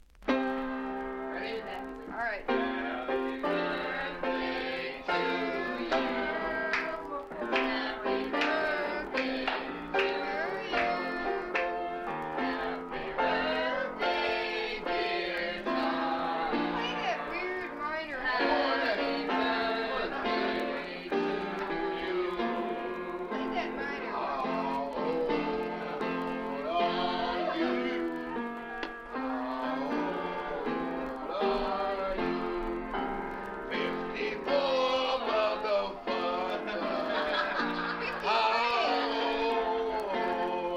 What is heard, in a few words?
happy birthday rap